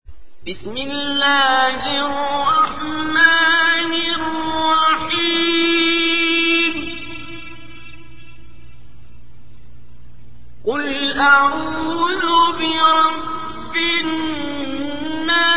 nas surah; abdulbasit

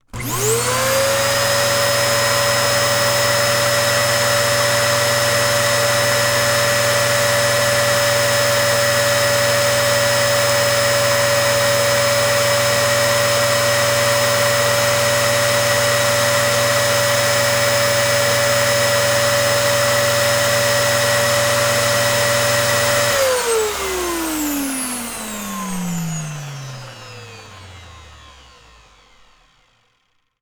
Vacuum

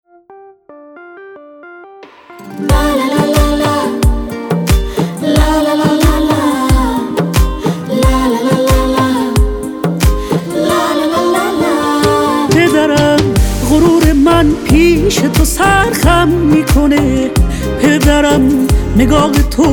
father day song

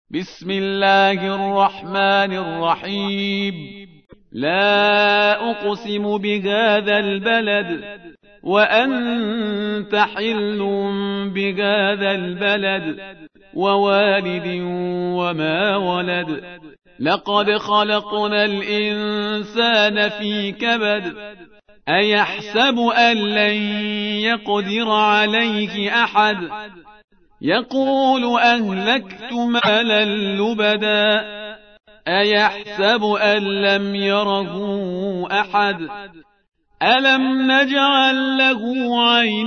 balad surah

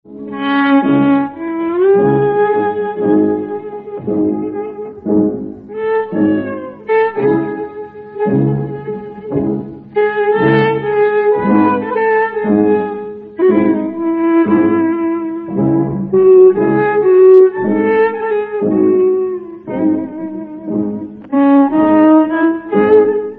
Ringtoon

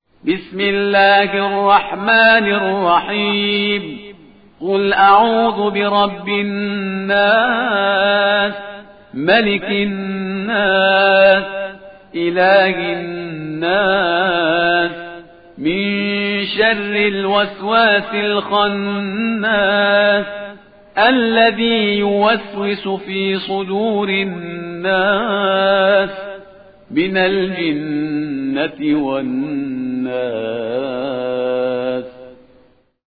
nas surah

